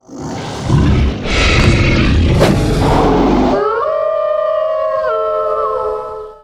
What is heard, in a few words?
Werewolf Heavy Spawn; Act03 Cut Hub Outskirts A; Blood Knights; Xbox 360